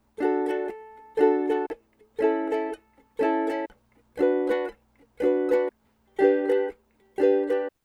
Ukulele Loops

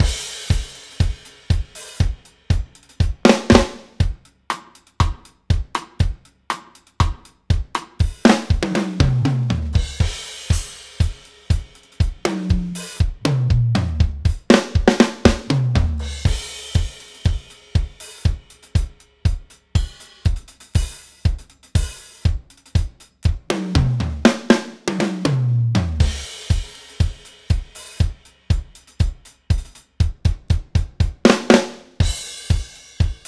Bateria Bachata

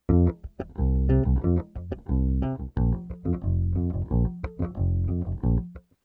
Baixo Vaneira Loops

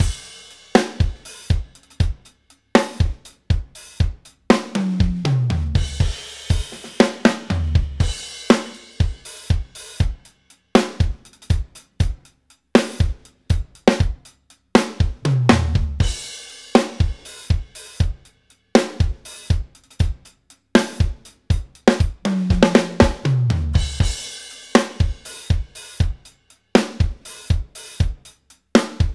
Bateria Arrocha

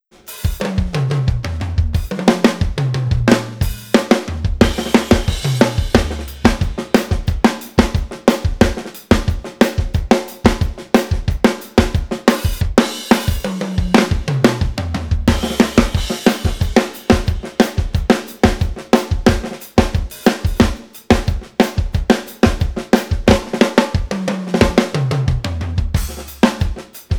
Amostra Bateria Vaneira

